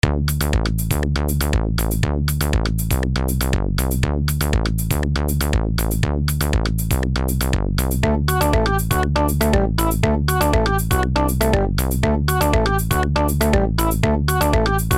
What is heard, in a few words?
Title & Menu; Twin Spin